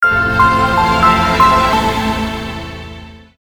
win symbol; Clover Rollover 2

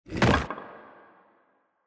reel Spin stone Land; Champions of Valhalla

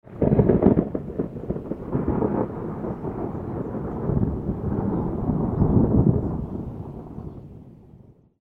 lightning Rumble; Champions of Valhalla

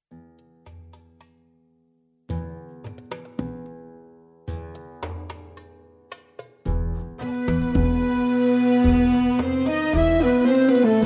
bg music; Eternal Wishes LuckyTap

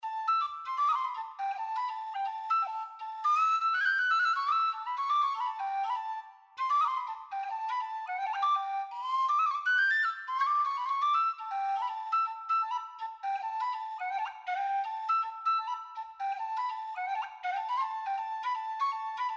bg2 flute; Highland Reels

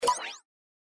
spin click; Beat the Bobbies 2

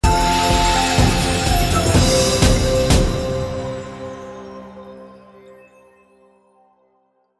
jackpot win; Shamans Dream 2

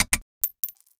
free games start button; Fluffy Favourites Megaways

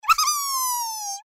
voice laugh; Fruity Burst 2

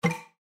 spin Click; tiki-beats; eyecon

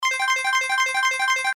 number rollup loop; Step Back 7's

